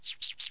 bat, halloween; stamps; seasonal

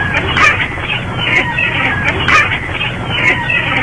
seagull; stamps; animals; birds

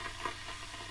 kettle; household; stamps

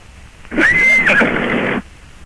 horse, equines; animals; mammals; stamps

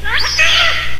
sea lion; animals; mammals; aquatic; stamps

